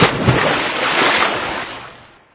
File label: splash